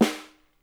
SN, Percussion

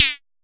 Pollard Perc, Syndrum

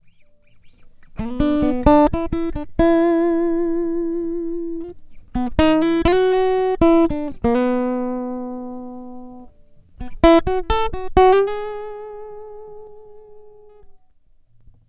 Guitar, Trip-Hop Loops; Sonic Foundry; Rondo Brothers Trip-Hop Loops & Samples; Hooked On Hookers

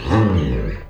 FRET ROLL, Electric Basses; E-MU Producer Series Vol. 8 – Platinum Phatt

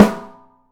OPEN PICOLO, 3D Drums

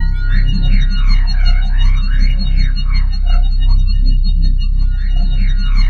C1, SynthLine; 90 s Sample CDs; NiceBeats; SynthLine VOL-5

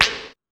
Techno, EMU XL-7

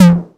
808 TOMS; Wizoo - Platinum 24 Electronic

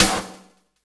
SD, 170 BPM; Ueberschall; Drum & Bass Resonance

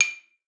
D6, xylophone; Xsample VOL-4

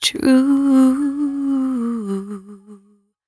113bpm C#, Velvet Vocal; Zero-G

